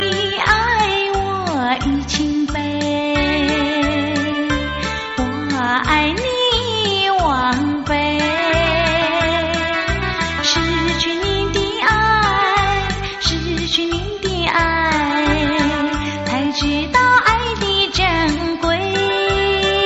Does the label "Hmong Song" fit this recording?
Yes